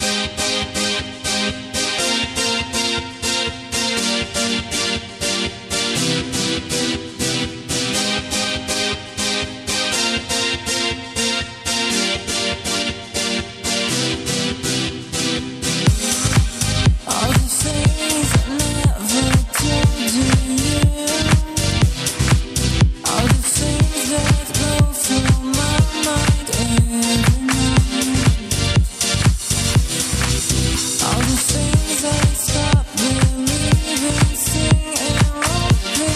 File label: Funky House Vibes